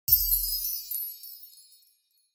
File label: christmas magic positive award chime minimal dry; esmteam